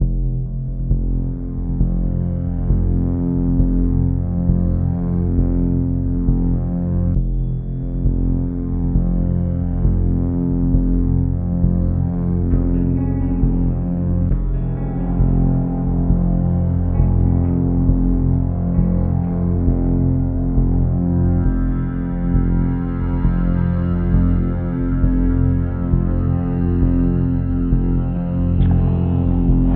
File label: Creepy Ambience